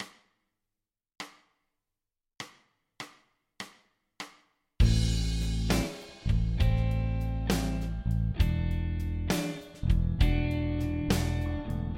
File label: Am7 dorian backing track Am7 Em9 D9